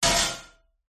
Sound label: impacts metal hit strike clatter rattle resonating roomy; zapsplat